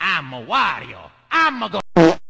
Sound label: fart; wario